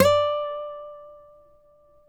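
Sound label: nylon, FLAMENCO, Guitars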